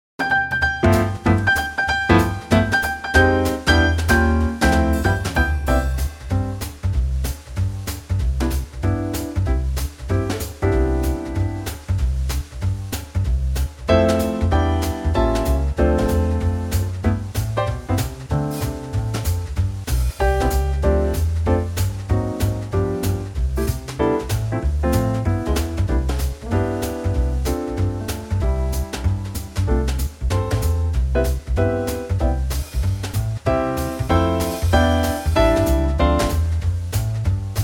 C Trio

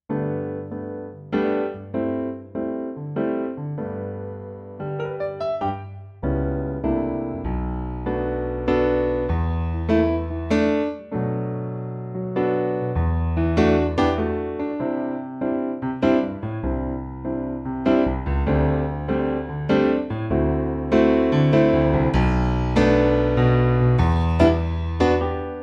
G piano; key - G - vocal range - D to D

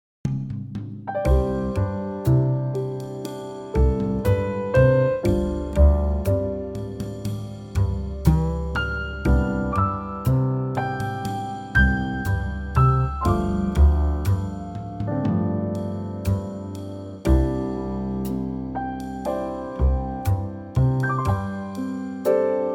C trio

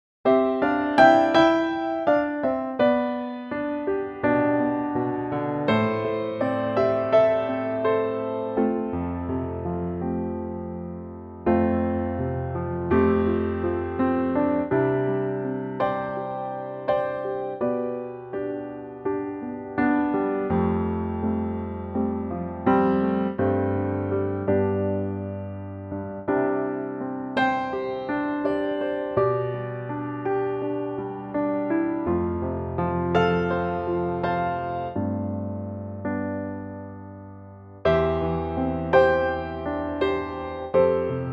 piano; C to D Pianotrak; key - C to D - range - G to A; Piano only arrangement; Key Change included

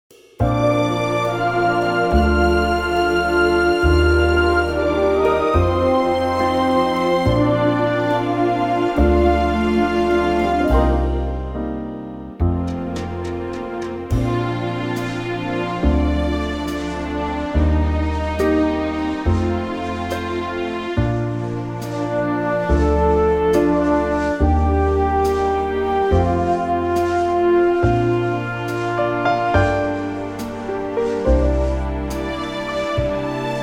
Bb orchestral; key - Bb - vocal range - A to Eb

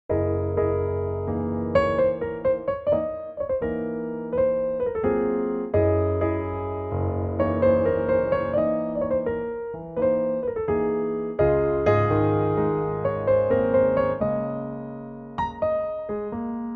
piano trak Db Eb F; key - Db to Eb to F - vocal range - Ab to D (optional F top note)

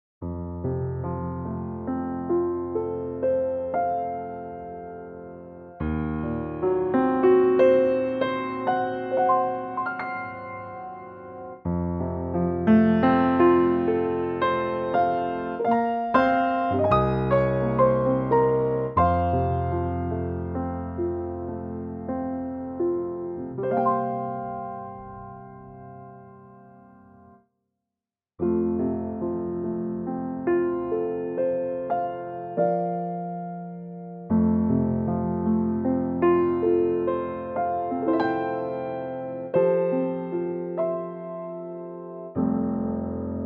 F Piano; Pianotrak

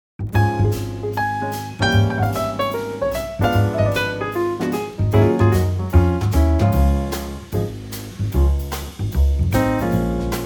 Db trio; key - Db - vocal range - Db to Eb

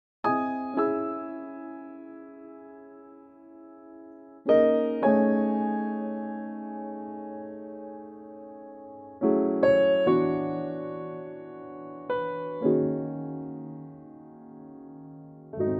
Dbm piano; Pianotrak; key - Dbm & Db - vocal range - Ab to Db; Here's a really lovely and intimate piano only arrangement

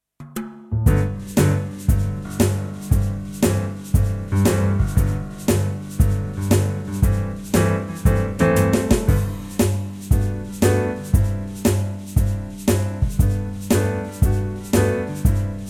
no organ

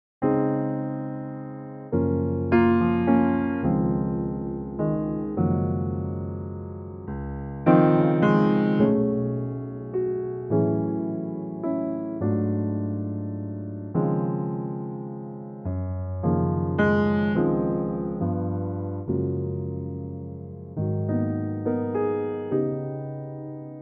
piano Db

